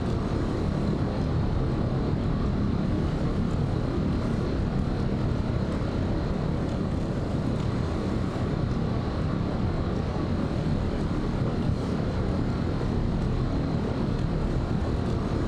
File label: ship destroyer idle; Stellaris; machineage; ships